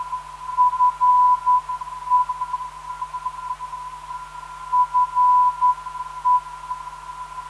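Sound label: non-directional beacon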